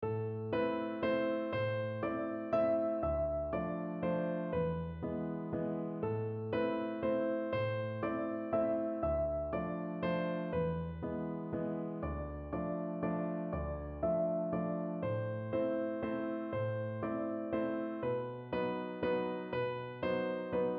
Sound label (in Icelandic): vals; piano leikur 1 hefti